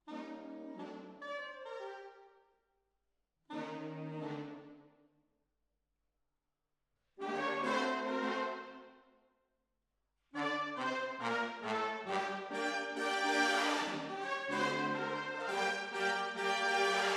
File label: Hall, Jazz for piano